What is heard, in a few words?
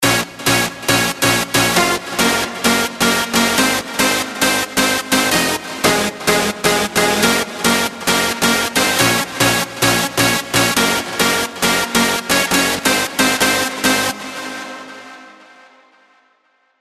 COMP BOUNCE LD